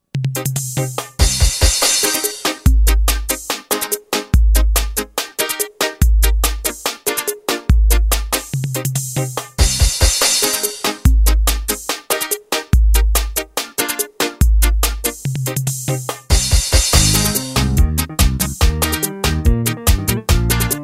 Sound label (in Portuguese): ritmos; gasparzinho